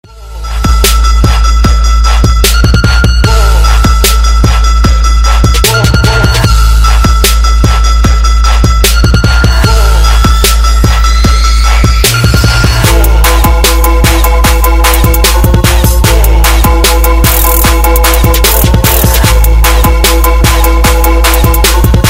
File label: BASS BOOSTED